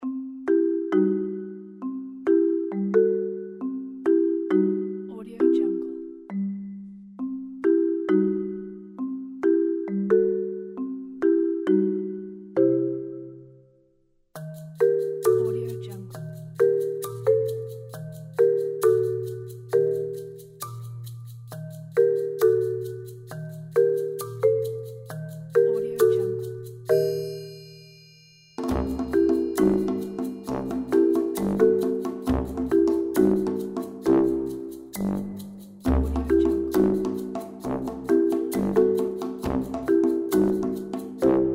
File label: sneaky